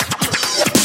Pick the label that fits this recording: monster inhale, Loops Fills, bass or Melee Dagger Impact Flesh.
Loops Fills